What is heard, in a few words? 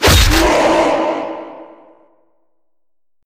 Skill Berserk